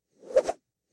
fighting double air movement

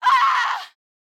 woman injured scream; soundscrate

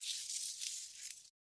Leaves and Twigs Rustle; SoundsCrate

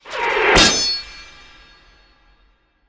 sword hits; soundscrate

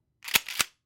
Shotgun Racking; SoundsCrate

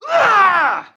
man injured scream; soundscrate

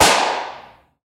classic gun shot

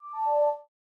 graphics application notification

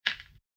footfall gravel step

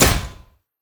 Gun Shot Metal Hit; SoundsCrate

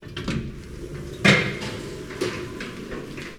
elevator mechanism wide

